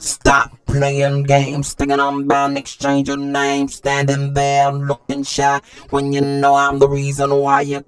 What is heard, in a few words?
ROBOTRAP, funky vocals, rap ragga; USB Soundscan vol.18; AKAI